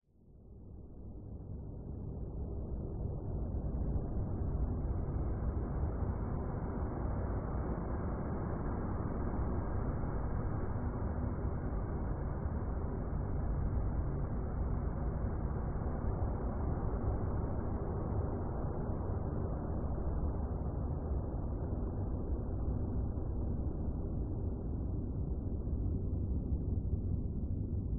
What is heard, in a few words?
horror landscape drone dark night